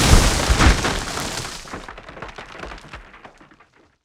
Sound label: TREE DOWN